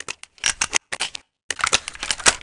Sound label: Handgun Reload